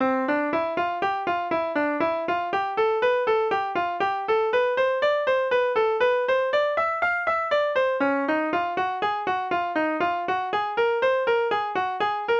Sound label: Classical, jazz; First Steps